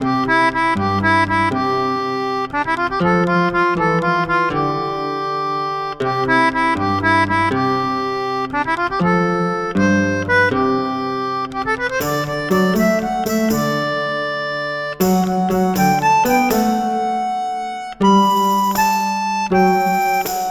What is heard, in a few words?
festival loop, music